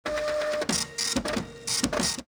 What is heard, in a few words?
printer; Soldier Of Fortune II Double Helix; XBOX; ambience; nyc